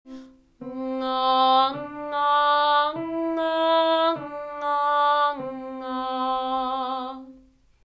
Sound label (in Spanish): Paraguas NGA